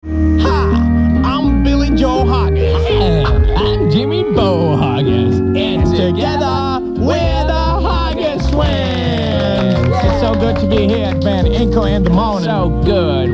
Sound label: Me and My Harmonica, jingles